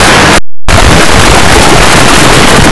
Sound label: lava